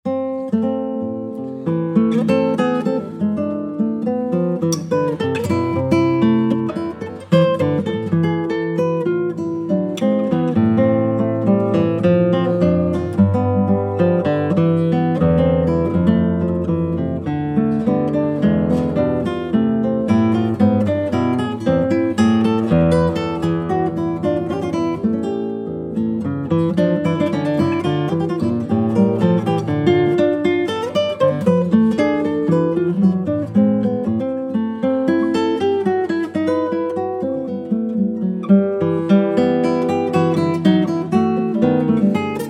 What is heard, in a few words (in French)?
guitare; cordoba